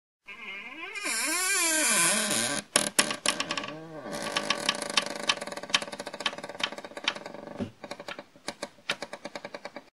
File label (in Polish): OTWIERANIE DRZWI; Grudniowe Opowieści